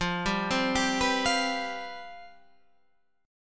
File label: C Major 7th Suspended 4th, F, guitar chord